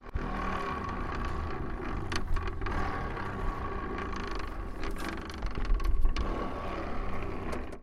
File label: tractor wheel